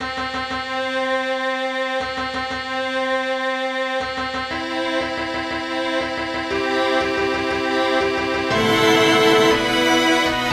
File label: wedding march; mendelsohn